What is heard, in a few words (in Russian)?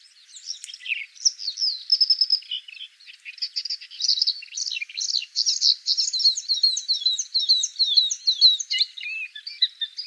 kamyshovka indiiskaia